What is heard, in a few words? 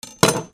KITCHEN